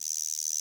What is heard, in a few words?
CR78 guiro; 90 sSampleCDs; Crypton - DrumBase5000